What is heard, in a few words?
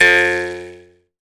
jaw harp, Pitched Percussn, Jaw Harps